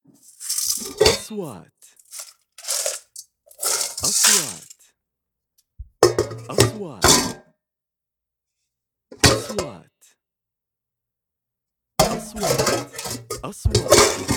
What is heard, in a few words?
SFX plumbing metals irons